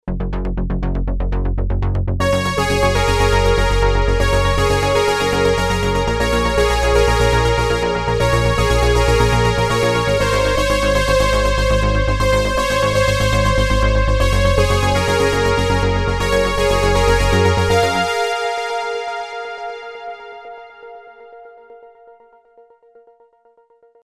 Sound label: ARP; redshift6; ARP BEYOND SUNSET; supercriticalsynthesizers